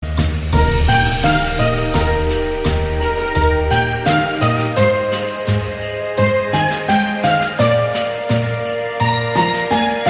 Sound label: Jingle bells remix